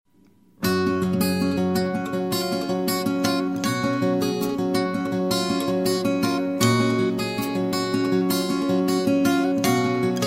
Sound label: Guitar